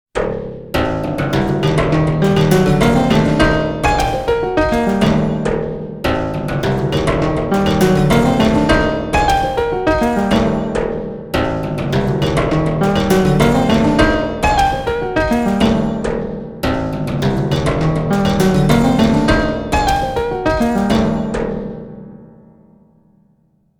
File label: Sequential Prophet X Junk Piano